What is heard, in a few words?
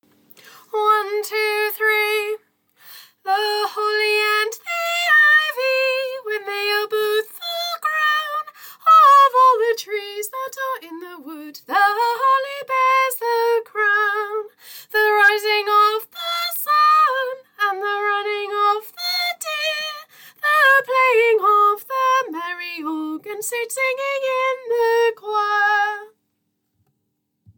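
soprano, choir; Choir Christmas 2023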